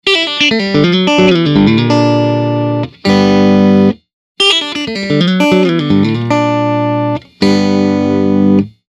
riff off on

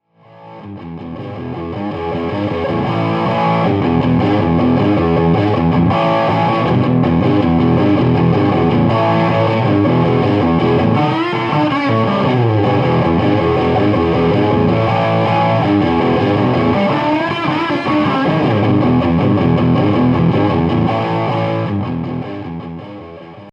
solo high gain

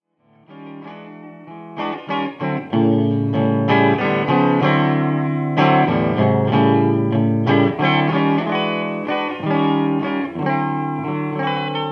clean low gain

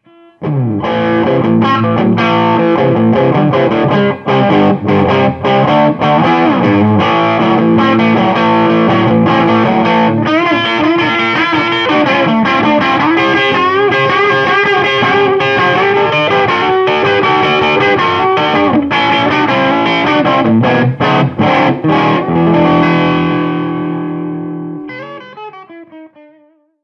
les paul clean low gain

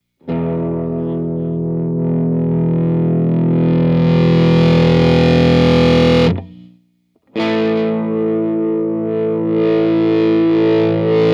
swells loose tight

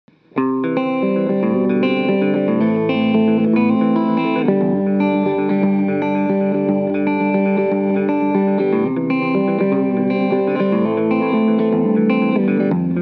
Fast Heavy

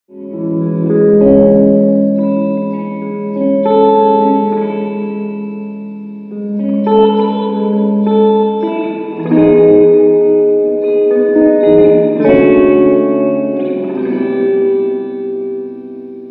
E4 Long Spring Full Blend

